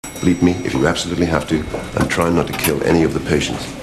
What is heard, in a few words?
bleep me